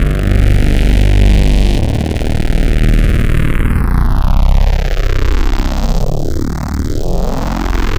C64 Bass, Commodore 64, Chiptune

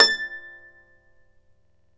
Celtic Harp, Plucked Strings

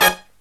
HIGH HIT, Brass Riffs

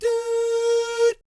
DOO, Vocal Planet Jazz

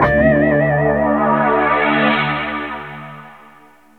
bass (vibra stack); Sonic Foundry (Sony Creative Software); Ugly Remnants VOL-2; Basstuff

